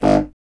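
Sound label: wind contrabassoon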